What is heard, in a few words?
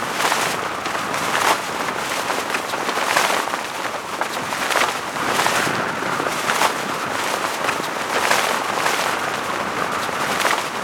cloth flag wind